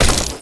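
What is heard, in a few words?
chain gun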